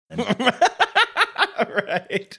laughing saying right

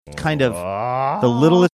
drawn out awwwwwwwwwhh

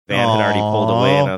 long aww

